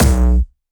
B1, Jumpstyle Kick; vengeance essential club sounds-3; VEC3 Bassdrums

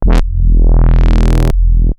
Roland A E1, synth samples; Roland S100; musicradar-synth-samples

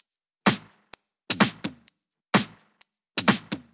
Drumloops 128BPM

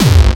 BD Distortion, Bassdrums; Vengeance Samples

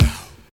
70s and 80s Kicks; Vengeance Samples

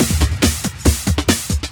Breakbeat; Vengeance Samples; VEC2 Loops

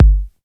Bassdrums; Vengeance Samples

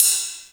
Cymbals Ride; Vengeance Samples

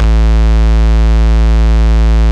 Bass Root A

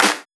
Claps

